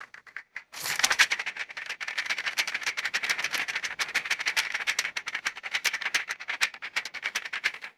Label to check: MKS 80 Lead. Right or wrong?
Wrong